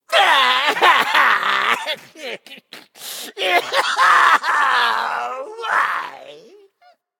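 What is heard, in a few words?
non-verbal boohoo; smite; voicepack; jingwei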